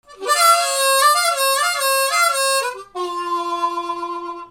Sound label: MUSICAL